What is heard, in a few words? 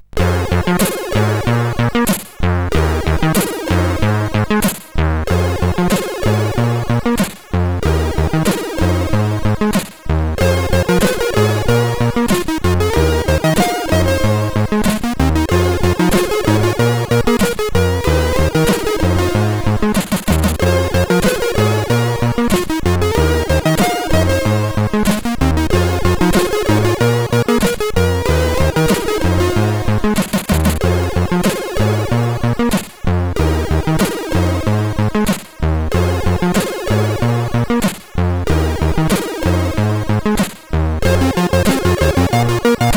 sid, CSG8580R5; Over Heated